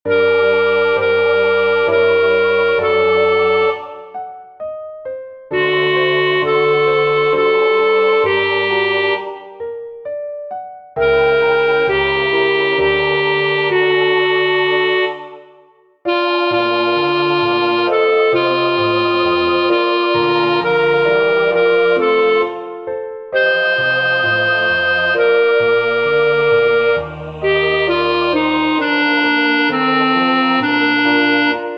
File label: Chur SATB Alti; G.B. Pergolesi - Stabat mater; 3 O quam tristis et afflicta